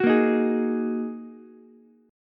Bb 7th Suspended 4th Sharp 5th down Ukulele; strummed; JGuitar

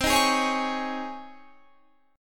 Db Major 7th Suspended 2nd C

